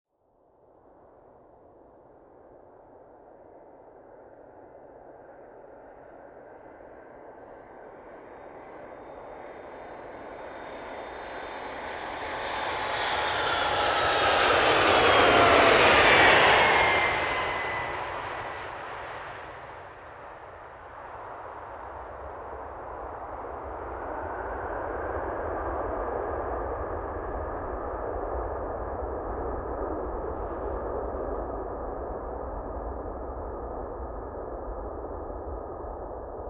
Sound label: Aircraft Flying Over Whirring Sound